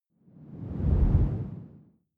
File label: whoosh simple long low; swooshes-swishes